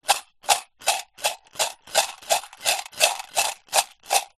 foley money coins in metal tin shake; zapsplat

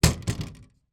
household bookcase glass panel door close

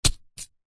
warfare knife stab into soil dirt single

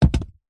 stone large set down thud; foley

